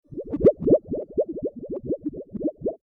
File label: Under Water Sound; FXHome